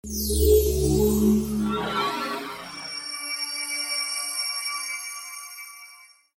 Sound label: boss appear; lich; Adventure Time - Break The Worm; ThrillGames